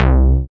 DM BAS, Dance Mega Synth

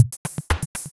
Loop, Drumsynth Drums; Percussion

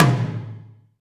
tom, Alesis DM5

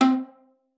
C4, VIOLA PIZZ; Xsample VOL-5; 90 s Sample CDs

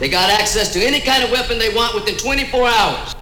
VOX GENERAL; Megabass Remix! 2

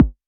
Multi-Kick Short

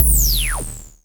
Klone Dual-Percussion-Synthesiser; EFX

